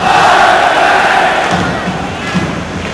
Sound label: Chant, Crowd; David Beckham Soccer; XBOX